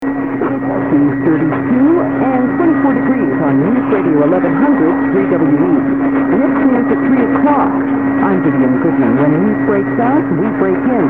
1100 Cleveland OH, mw dxing; WWWE

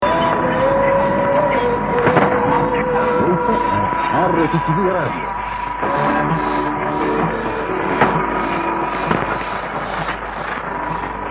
1580 unid like call, strong station id; from 1985

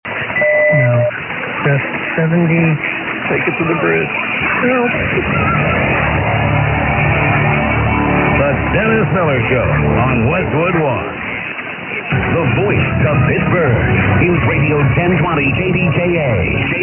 1020, mw dxing; kdka

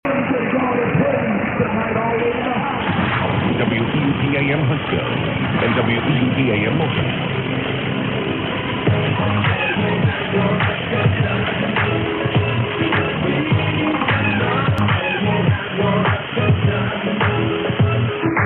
1700, mw dxing; weup

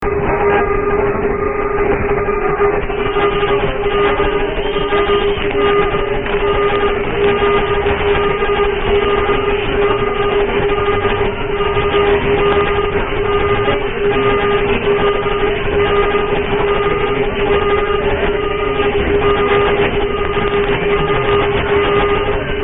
weird tones like alarm; Mediumwave Dxing

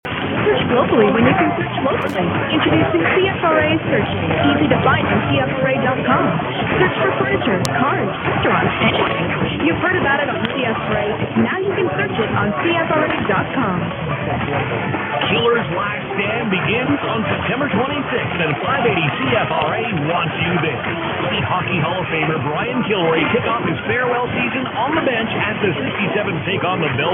580, mw dxing; cfra

